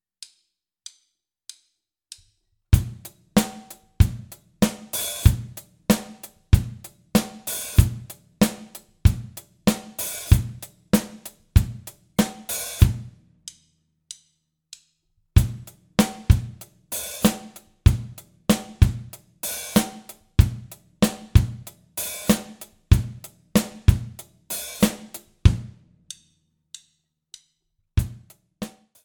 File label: grooves